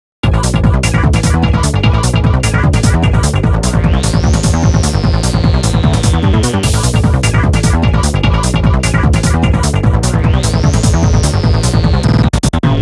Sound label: glitch